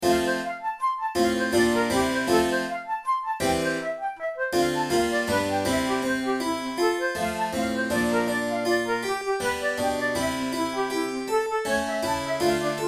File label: corelli violin sonata op5 no4 iii vivace, flute